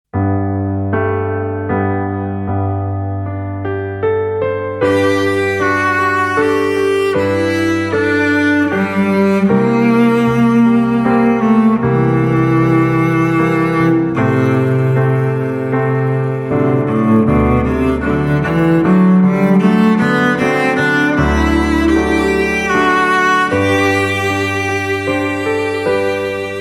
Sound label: SOFT WALTZ